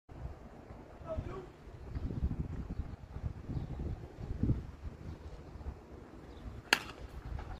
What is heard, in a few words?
baseball